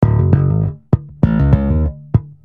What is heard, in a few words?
Bass Slap Ab, Eb 100